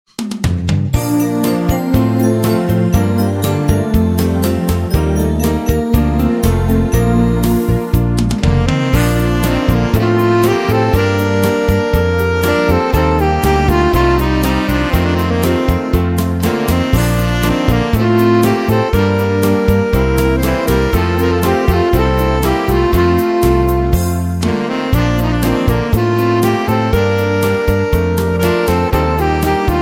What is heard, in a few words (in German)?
Saxduo